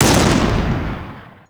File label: axis anti air; WAR